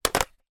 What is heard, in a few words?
household telephone receiver place down plastic modern